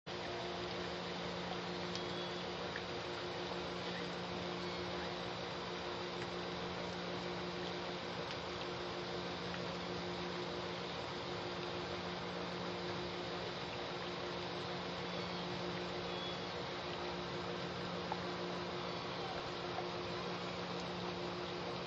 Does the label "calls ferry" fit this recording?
Yes